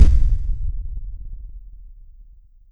step; doom3; hellknight

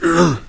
zombie pain; doom3

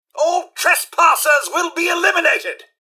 attack; nukarobots; mrhandy

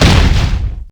step; morbus

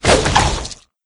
attack novoc, maggot; doom3

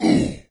melee; doom3; commando

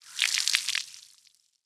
body bloodspout; flinder

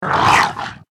attack, zomb female; nmr

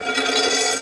metal drag

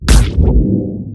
charge hit

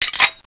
clip out; cso

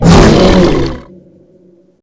pain boss

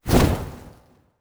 wing

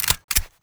g36c clip in; weapons

